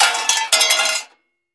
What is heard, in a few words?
Junk Thrown Junk Pile, junk crashes